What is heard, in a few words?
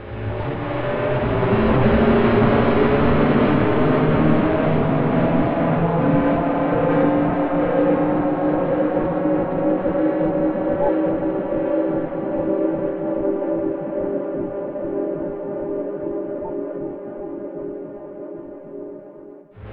Takin Off, Guitartronics; Sonic Foundry (Sony Creative Software); Ma Ja Le Ethereal Textures